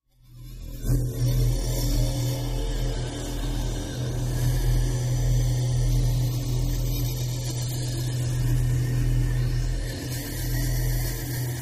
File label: No Comfort Dist Elect, energy beams